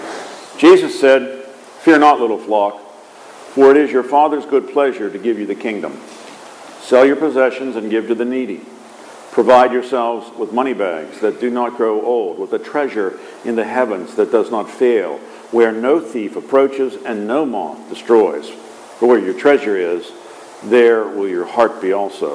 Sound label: Gospel